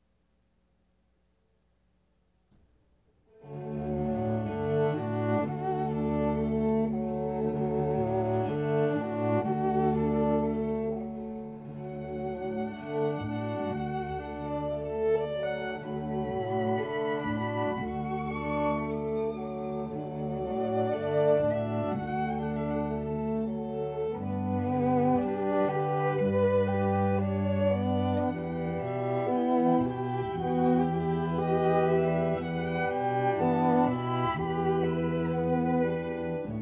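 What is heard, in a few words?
string quartet; Meditation from Thais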